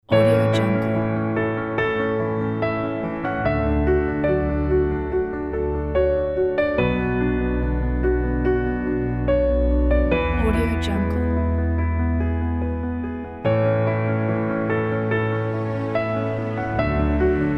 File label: inspiring piano